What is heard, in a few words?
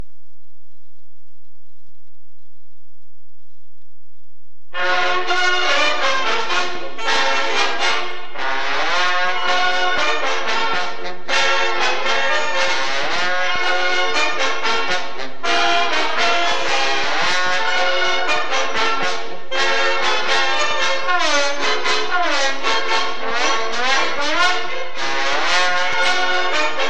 Trombone, Band; Lassus; Razorback; musical memories of 1968